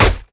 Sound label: axe mining; factorio; teflilly